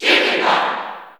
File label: Cheer English; Jigglypuff; SSB4; SSBU